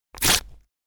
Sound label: unlink